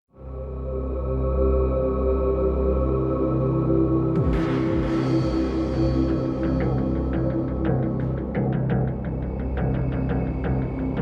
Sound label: Tension; Yakuza Kiwami